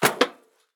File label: landing proflist; Escape from Tarkov